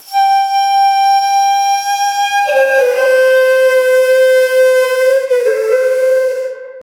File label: generate a shakuhachi flu, flute